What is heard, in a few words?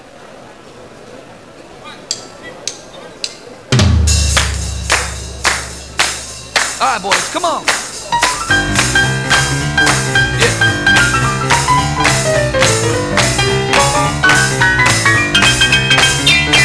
boogie jump